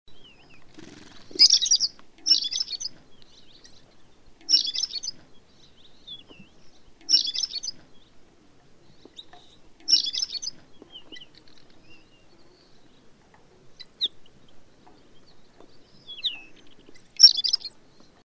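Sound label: Mongolian ground sparrow, Pyrgilauda davidiana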